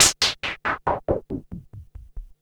NOIS FX; Back In Time Records; Big Bang

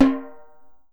SOPRANO, Steel Percussion; E-MU Producer Series Vol. 7 – Old World Instruments

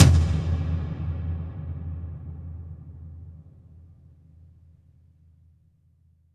toms, cinematic impact, drum

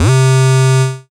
synth, c, Techno Synths; Dance Synths

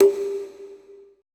CONGA L; BPC DRUMS

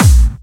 Clubby Kicks, Bassdrums

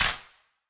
Wider Clap

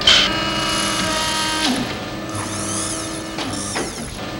HYDRAL, HYDRAULICS; Northstar; The Wizard VOL-1

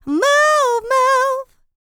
LICKS, Vocal R&B Dance; LT DANCE

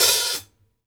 CLOSE, HI-HATS

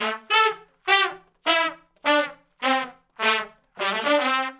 R&B Riff (A) unison, Horncraft

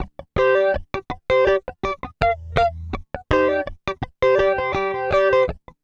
FUNK, FUNKY GTR

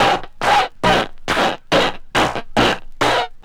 Kaos FX, Ambient Grooves

